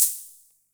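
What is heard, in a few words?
Olson Cym B, cymbal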